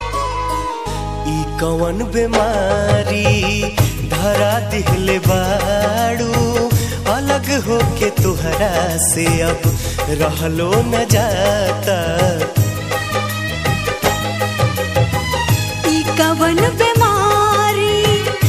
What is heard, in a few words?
BHOJPURI RINGTONE